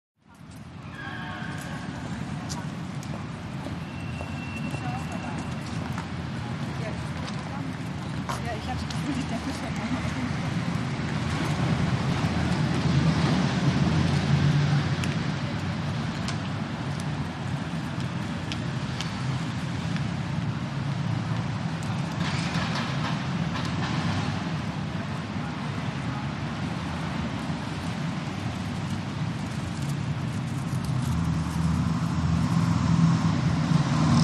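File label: City Side Street Background; big city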